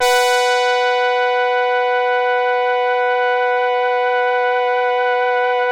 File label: QUINTHORN H4, HARD BRASS; Music Store - Claudius Diemeter Schwerlos